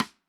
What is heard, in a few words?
Rim Sidestick; One Shots; Drums; Plymouth